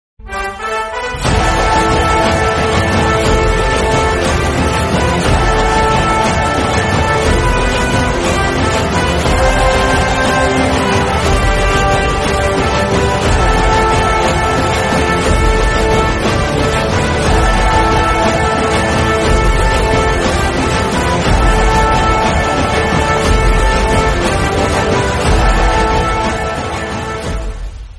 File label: helicopter entry bgm; kgf 2